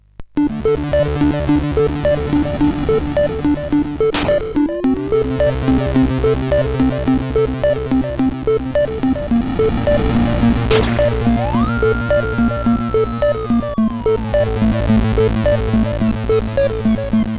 BoxyMoxy, CSG8580R5; hvsc

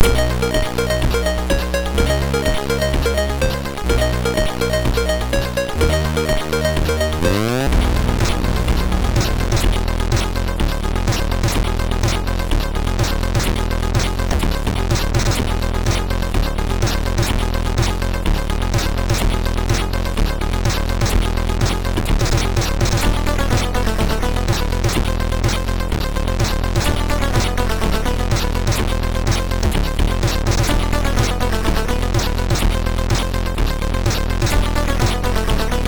Orion Intro, MOS6581R2

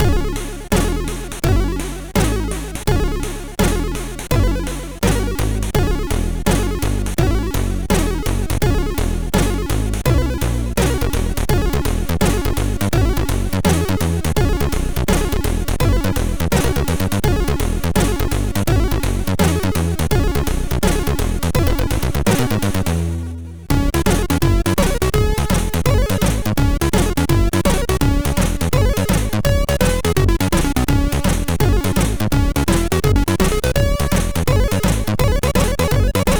MOS6581R2, sid; In the Line; hvsc

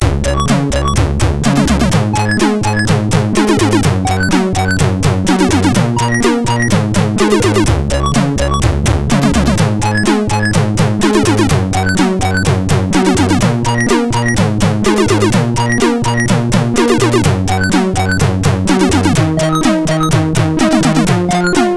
Music MOS6581R4

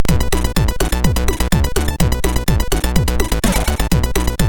Acieeed, MOS6581R2

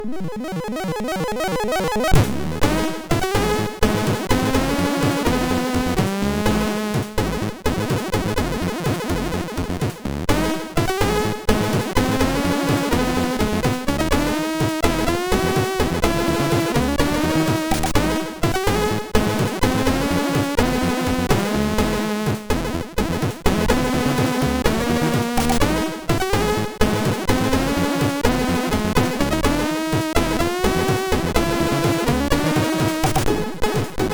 8580 Funky Ideas, MOS6581R2

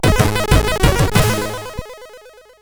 Game Muzak, sid CSG8580R5; Moon

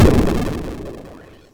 SFX, MOS6581; Narco Police

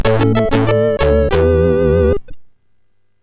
Jingles, MOS6581R4 sid

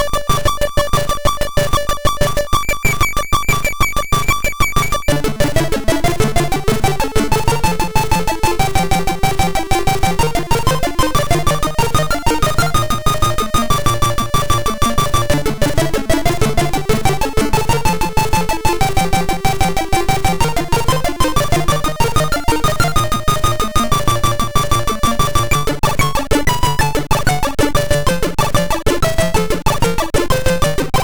Intro, sid MOS6581R2

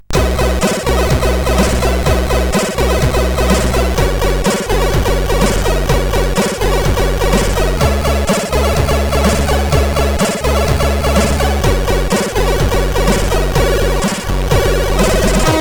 sid CSG8580; Lost in Space

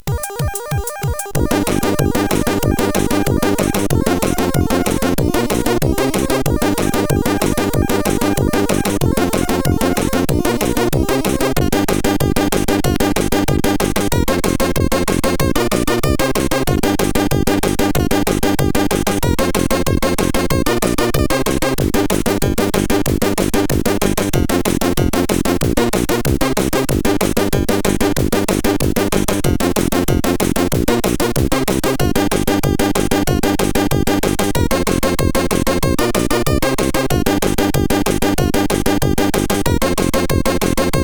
Techno Mix, CSG8580R5; hvsc

